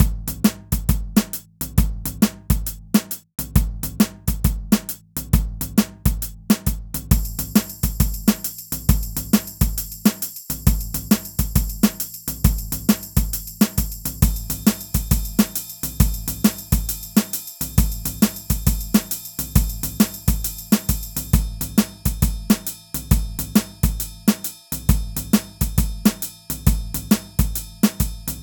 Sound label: jazzy beats and percussion 135 loop regular